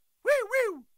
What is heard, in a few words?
WOO; South Park